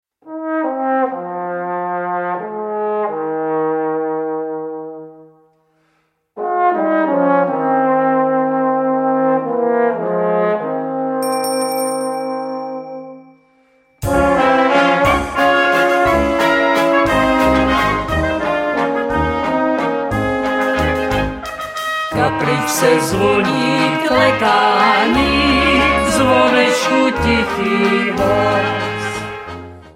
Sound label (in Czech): zvonecek klekani